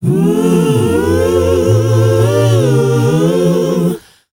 mm choir, S. African world voices; ILIO; Vocal Planet

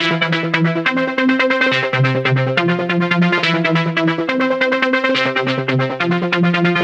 NRG Pad

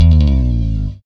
BS CHPSTK, Chapman Stick; Roland L-CD701

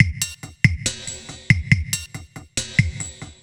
SloMo Beats, Loops 140 BPM

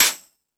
FX Sounds, Drum Machines E-MU Pro-Cussion

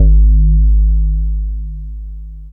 bass Sine; Sonic Foundry (Sony Creative Software); Ugly Remnants VOL-2; Basstuff

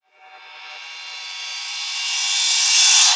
Reverse Cymbal; Best Service; House Definition VOL-1; Cymbal Stuff

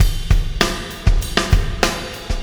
Beat, Processed Drumkits

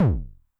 BD MOD [Pitch+Depth+Overdrive], 909mod, BASS DRUM; classic machines

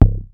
Bass Drum, Cwejman S1 MK2

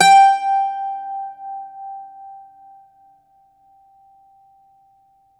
Irish Bazouki; E-MU Producer Series Vol. 7 – Old World Instruments

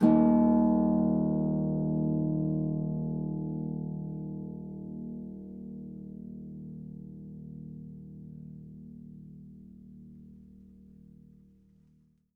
HS D#1, Harp; Miroslav Vitous - Percussion & Harp

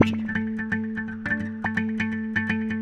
Wah Wah Gtr; Guitar

